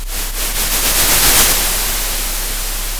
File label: pop, Sound of a thunderstorm